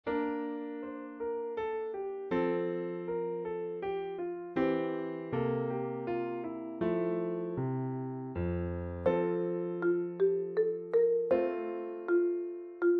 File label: Mallet Solo